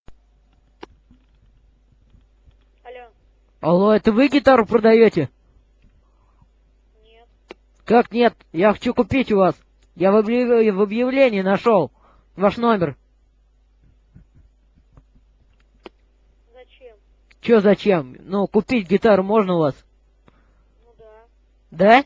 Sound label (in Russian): gitar